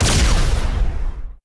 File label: Cannon Shot; Magna Cannon